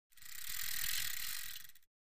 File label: Biking Pedaling